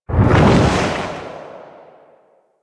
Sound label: Reaper Sprint Whoosh; Warframe; Wraith